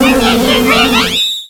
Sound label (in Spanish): Grito de Vespiquen